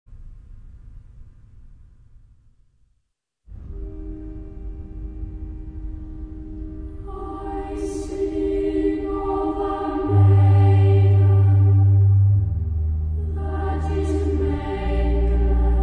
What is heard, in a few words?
Cathedral Choir